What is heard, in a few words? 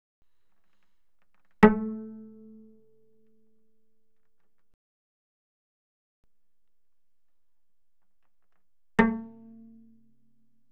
Cello pizz ff sul G A3 Ab4; Strings